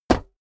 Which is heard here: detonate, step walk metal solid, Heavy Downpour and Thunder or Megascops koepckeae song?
step walk metal solid